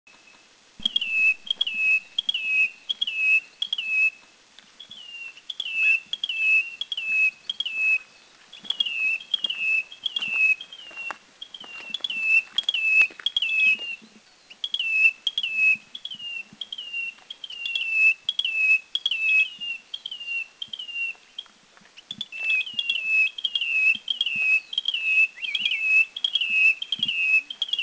Taiwan Bush Warbler Yushan; Taiwan Bush-Warbler Bradypterus alishanensis Country endemic; at least 4 seen Yushan NP

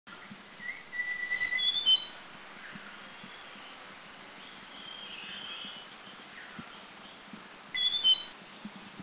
White-tailed Robin

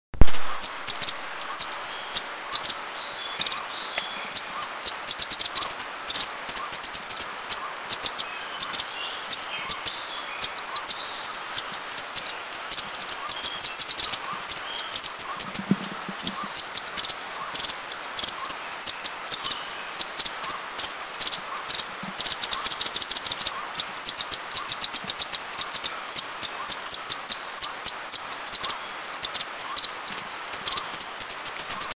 Golden Babbler Chatter; Eaglenest; India

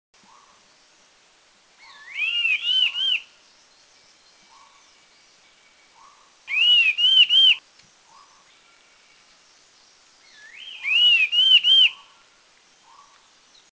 Cachar Wedge-billed Wren Babbler Call; Mishmi; India

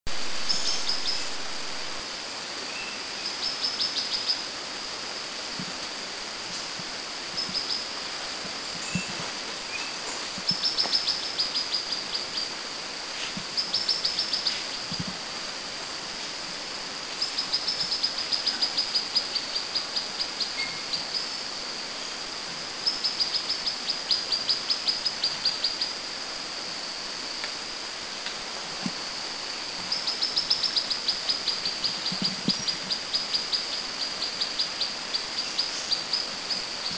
Apo Sunbird Kitanglad; Mount Apo Sunbird; Aethopyga boltoni; Mt.Kitanglad; Philippines; A pair seen on the high trail at Mt.Kitanglad.

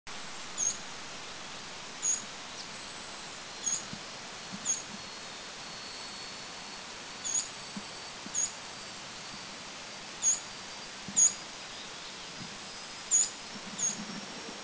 Taiwan Fulvetta Call; Alcippe formosana Country endemic; Anmashan; Anmashan top walk

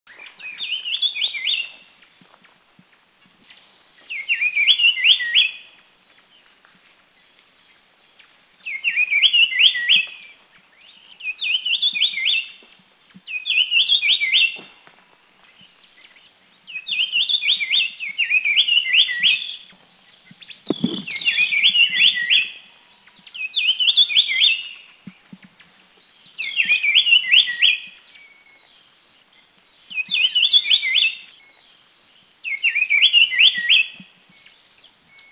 Brown-cheeked Fulvetta, Thailand; Kaeng Krachan

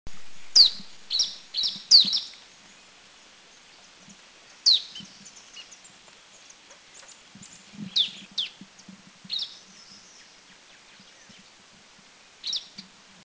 Hill Prinia, Thailand; Doi Ang Khan

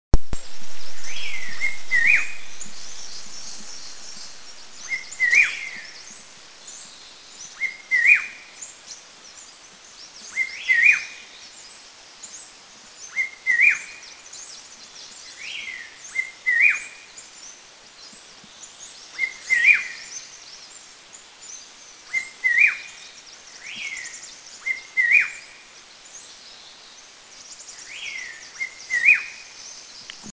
Black-headed Shrike Babbler; Eaglenest; India

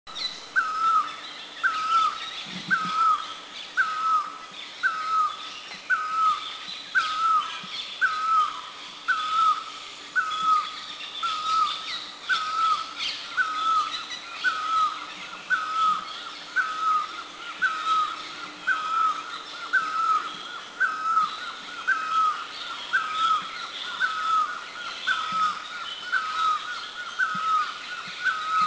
Great Barbet, Thailand

